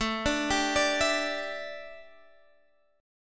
A 7th Suspended 4th, A, down, Guitar, Open F; A7sus4 arpeggiated

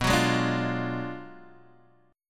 B Minor 6th Sharp 11th B; Bm6+11 Chord {7 9 6 7 6 X} strummed

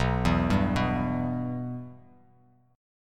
B Suspended 4th Sharp 5th B down, Bass Standard; Bsus4#5 arpeggiated; JGuitar

